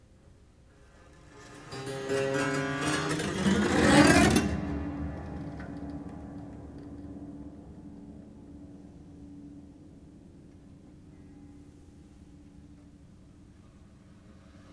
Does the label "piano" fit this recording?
No